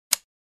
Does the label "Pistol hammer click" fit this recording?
Yes